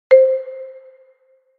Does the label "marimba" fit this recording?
Yes